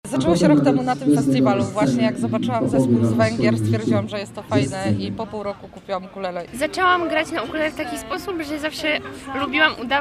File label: ukulele; poznan; dziesiaty i ostatni raz ukulele opanuje poznan